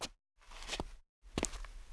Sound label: preslap; Mafie 1